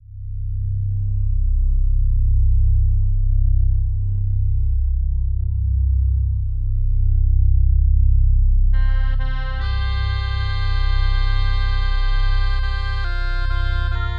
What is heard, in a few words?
Tension Russ Synth Jaleyka; Real Warfare 2 Northern Crusades